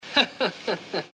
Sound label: laughter